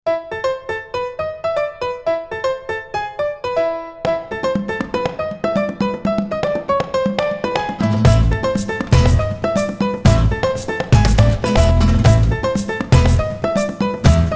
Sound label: Piano Samba; World, Vibey, Optimistic; Latin; Jane the Virgin